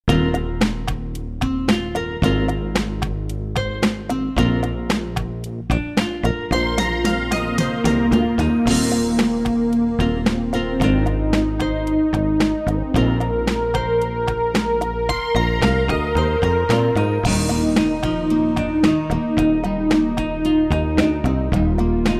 warm interlude

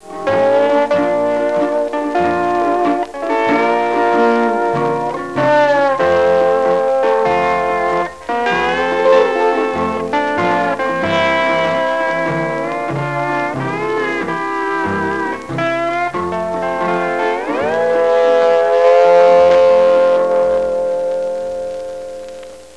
suonerie musica traditional usa country; american

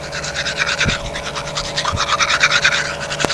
BRUSHING

